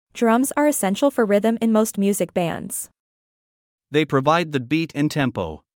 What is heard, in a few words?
Drums